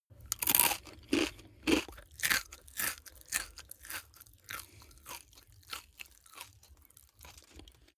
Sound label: eat crisp mouth open